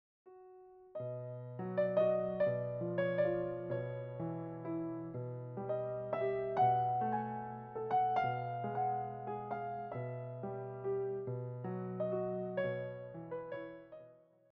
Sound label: Nocturnes In B Major Allegretto, piano; chopin nocturne selections vol 1